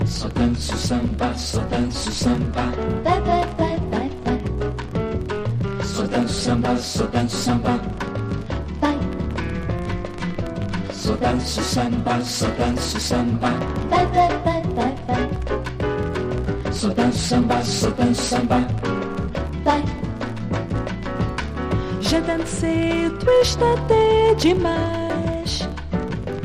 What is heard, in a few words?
samba jazz n samba; BRAZIL